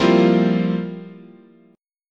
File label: F Major 7th Suspended 2nd Suspended 4th, chord